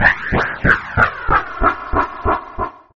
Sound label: Slow helicopter sweeping; gridtracker